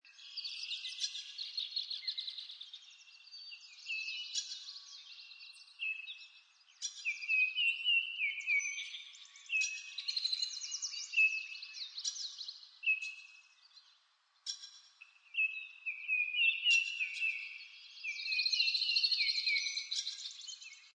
Nature Ambience Birds Farm Morning Loop, Very light peaceful outdoor countryside ambience; with small birds chirping cheerfully. Seamless loop; Environmental Sound Effects; LynneMusic